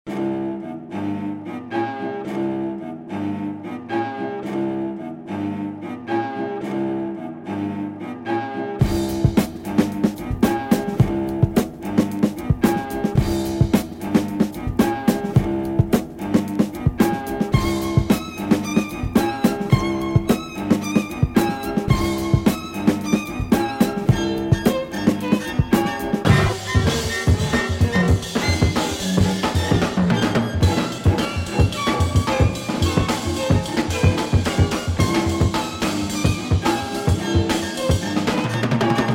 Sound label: kreng string